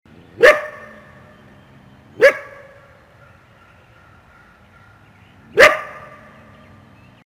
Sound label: coyote growl sound